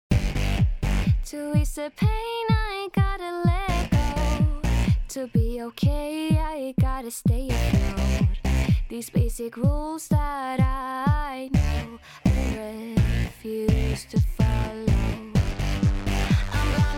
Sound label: Remix